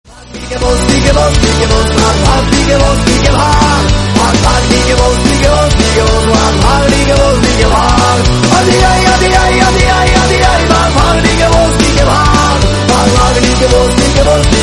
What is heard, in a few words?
hindi bollywood ringtone